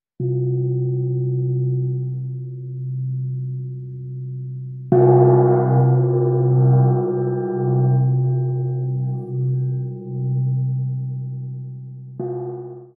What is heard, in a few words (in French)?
Gong; le nid sonore